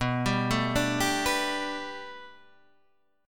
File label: B-Minor 7th Sharp 5th B down; Bm7#5 arpeggiated; JGuitar